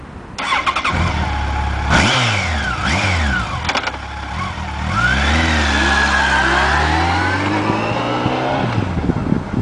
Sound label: fjr1300